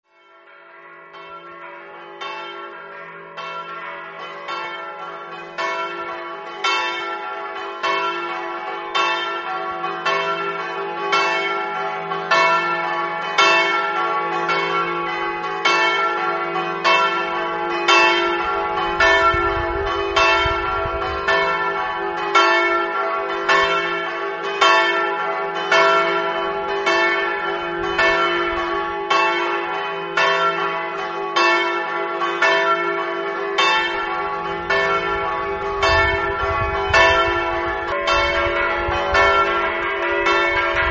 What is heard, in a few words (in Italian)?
ipplis, campane